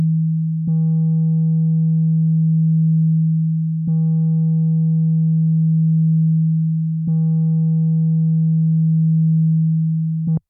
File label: sprott hp lp mixed; synth